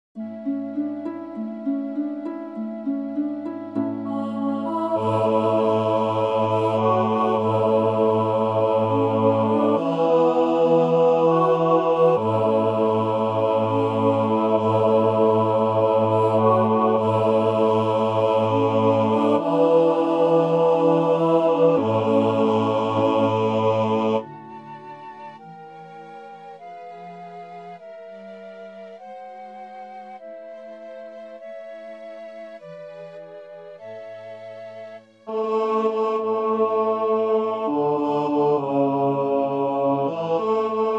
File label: Bass